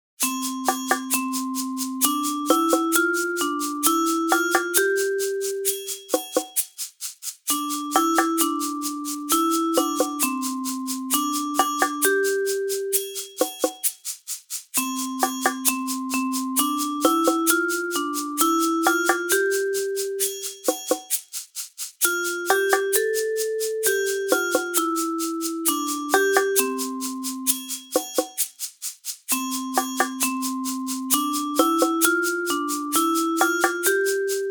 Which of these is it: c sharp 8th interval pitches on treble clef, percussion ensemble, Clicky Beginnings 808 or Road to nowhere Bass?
percussion ensemble